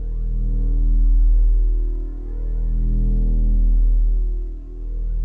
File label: ambience hum; Star Wars Obi Wan; XBOX